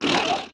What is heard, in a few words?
wriggler death; Factorio